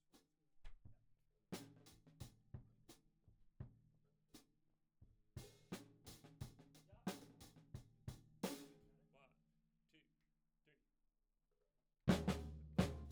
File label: Tom1